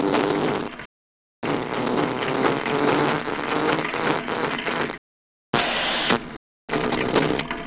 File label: funny machine fx